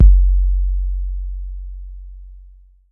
Kick 808

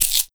Shaker Hemp; Bean Jamin

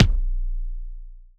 Kick, DnB Kick

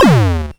kick; foxdot default

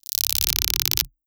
Sparks

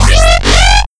Sweep Alarm

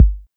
Kick Classic 808

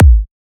kick drum

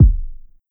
Kick, Ultranova

